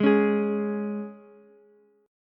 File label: C sharp 5th, Ukulele; strummed; C#5; x,8,9,11; JGuitar